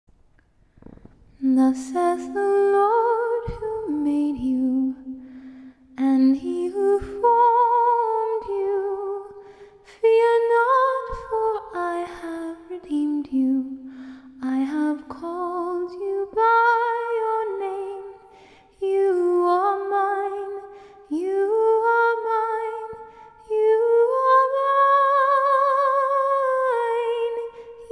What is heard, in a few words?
acapella scripture